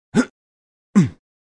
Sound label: jump male